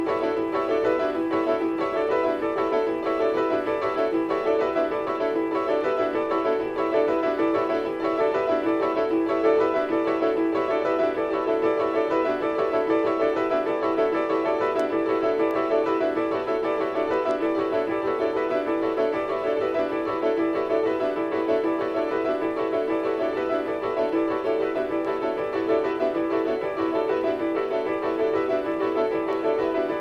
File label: Six Pianos